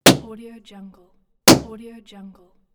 balloon pop

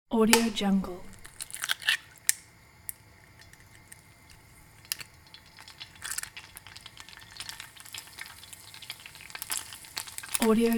frying eggs

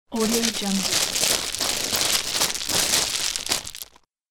sliding down on gravel; fxprosound

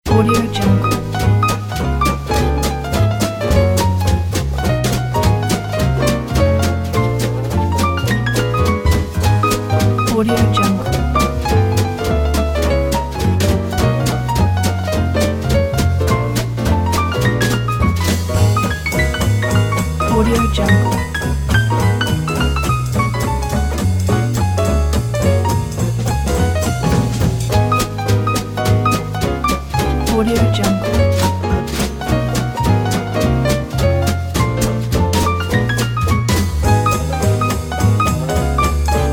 fast bop jazz

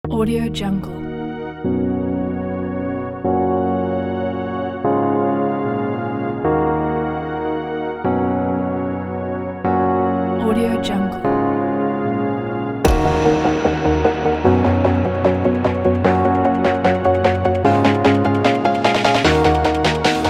the future bass light

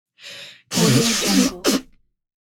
nose blowing

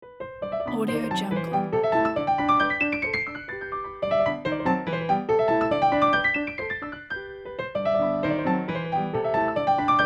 playful ecossaise; chopin

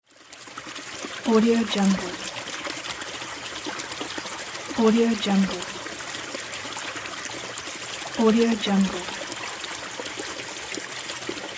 water

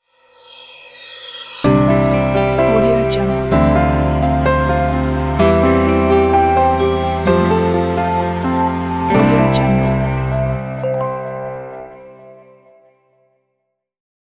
beauty logo

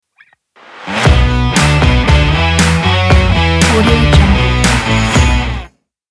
the catchy rock logo

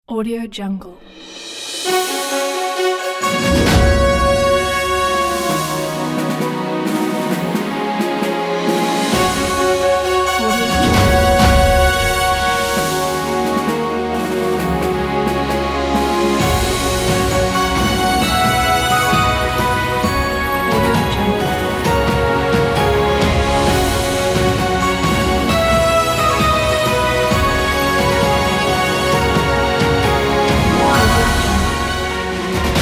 fanfare pack

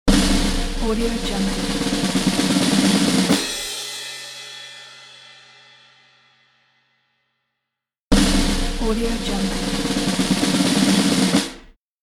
a drum roll

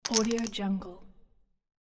few casino credits

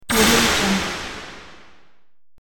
spell hit blasting power

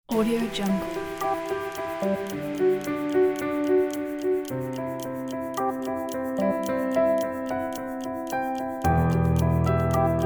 modern corporate pack; blue giraffe

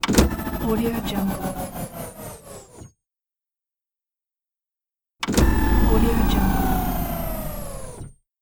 user interface mechanical turning off; inspectorj

